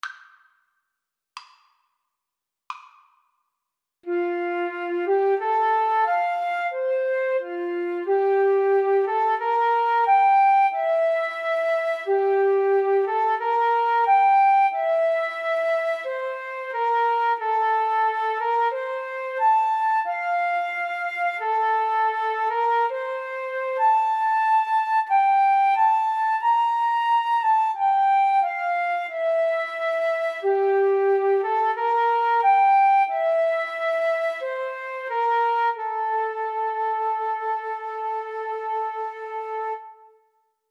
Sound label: jodler FL duet, Flute Duet